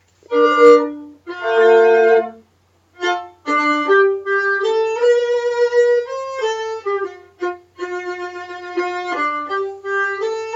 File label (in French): violon; John Anderson my Joe